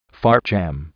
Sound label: fart jam